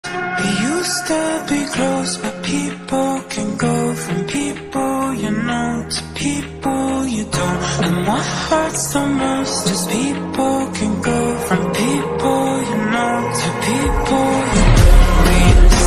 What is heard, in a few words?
slowed ringtone